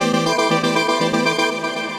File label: Nein Chords, Synth Rhythmic; Ableton; Skitter and Step